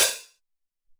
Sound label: M Amb Hat, Alesis SR-18